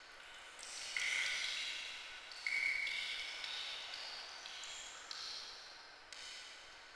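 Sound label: cave loop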